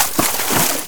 Footstep water Heavy

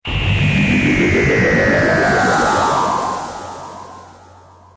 Scream

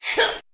bird pushing egg out; Angry Birds Seasons

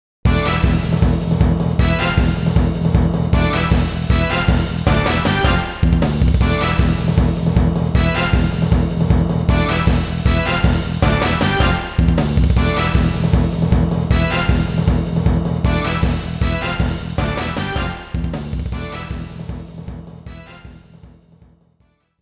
Stage Opening

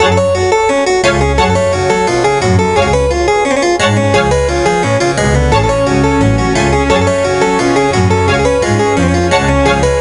Baroque; Mystery Tales: Time Travel; NDS

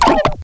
backpack; gonner linux macos ps4 switch windows xbox one gamerip 2016

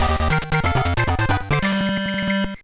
Jingle; Momotarou Matsuri; GBA

